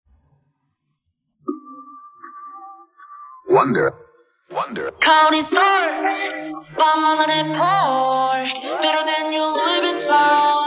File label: Vocal